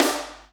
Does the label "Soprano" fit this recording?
No